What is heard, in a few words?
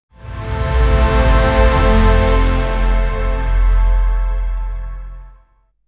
dramatic divine auditory